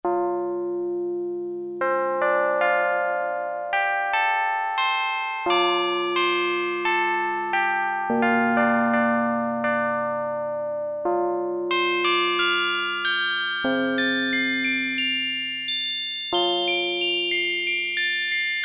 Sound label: CHIME, Roland Jupiter-8; Factory patches